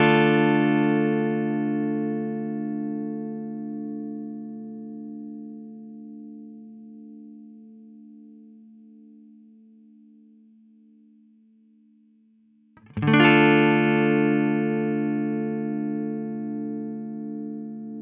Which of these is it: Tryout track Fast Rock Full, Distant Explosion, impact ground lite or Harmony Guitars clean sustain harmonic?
Harmony Guitars clean sustain harmonic